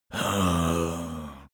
zombie moan idle

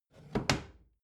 bathroom drawer close regular

